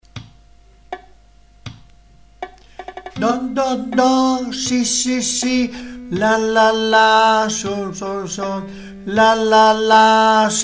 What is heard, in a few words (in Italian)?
Solfeggio cantato